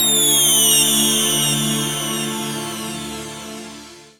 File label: Whistle; Blu Mar Ten; Jungle Jungle - 1989 to 1999 Samplepack